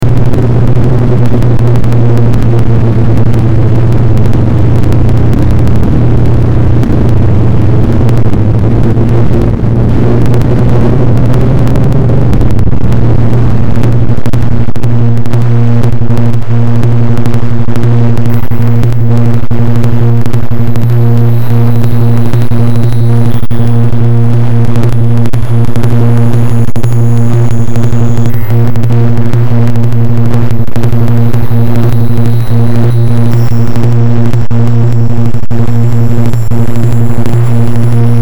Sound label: heavy noise